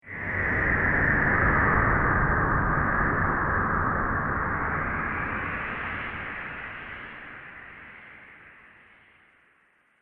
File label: Astral Winds, Science Fiction Sound Effects